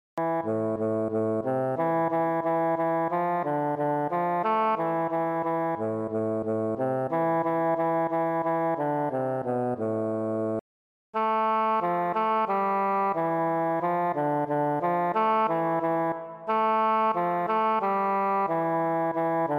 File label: Shanty Oboe Verse